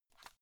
watch case small open quick

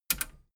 metal button radio click enable fast heavy

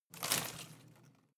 home plant leaves rustle regular short

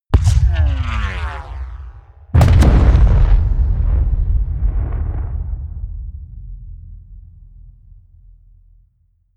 air strike

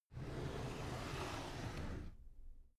drawer cabinet carton close soft

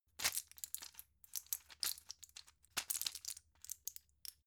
plastic blister handle long

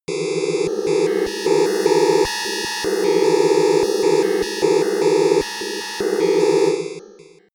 atmosphere on off

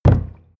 canister full put down

